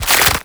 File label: foley, zombie revived; scripted intro; Half Life Alyx